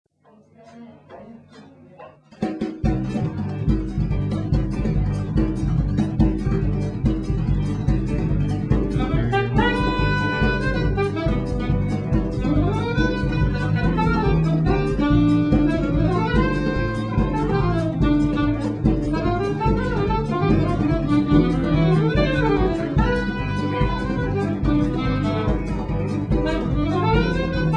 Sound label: samba